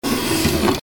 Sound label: electric door